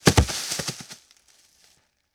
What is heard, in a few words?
Body Falls on a surface with leaves